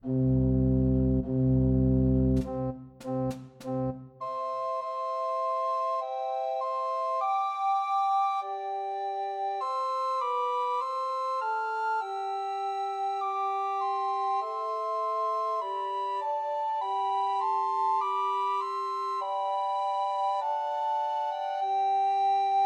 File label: Trios for Two Descant and One Treble Recorder; O Come All Ye Faithful; Christmas